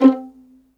C4 PIZZ, Violin; Q Up Arts; Denny Jaeger Master Violin Library; PERFORMANCE

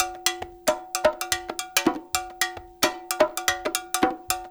PERC; East West; Funky Ass Loops

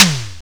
SNARE F; Greytsounds VOL-1; SDX SNARES

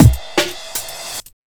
Speed Loop Cut Time, Drums Beats

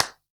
clap, hip; Best Service; Dance Mega Drums

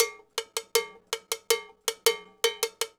Cow Bell, Latin Percussion

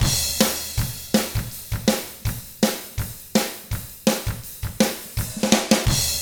ROCK, Double Platinum Drums

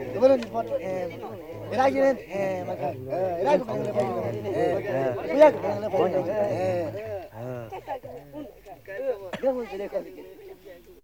XINGU, ETHNIC; AMG; Global Trance Mission VOL-2

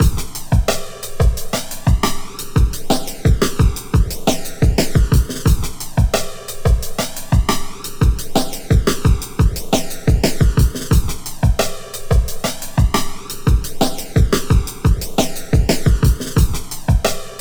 88 breaks; Fazer Too; Lockdown Breaks

